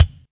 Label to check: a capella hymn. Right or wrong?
Wrong